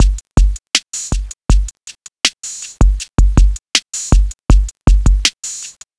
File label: box tech, Drum loops Electro